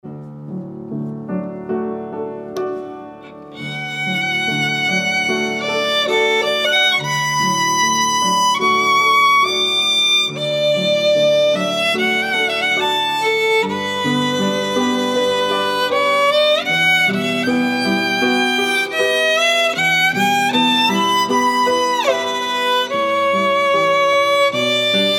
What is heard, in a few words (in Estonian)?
viiul